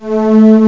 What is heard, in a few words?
strg; Atari Mega Archive; synths